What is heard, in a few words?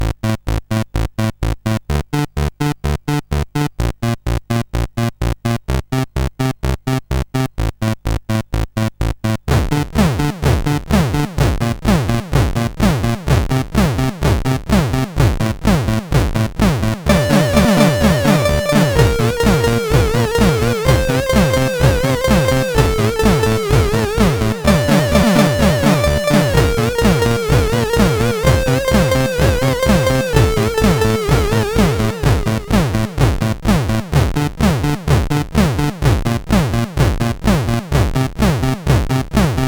Music Mix, CSG8580R5; Music-Mix 7